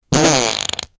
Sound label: loud fart; gross